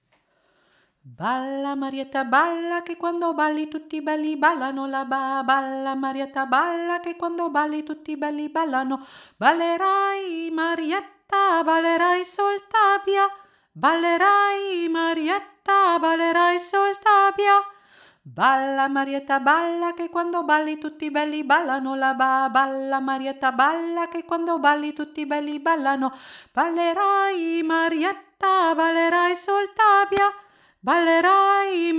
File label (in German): marietta hauptstimme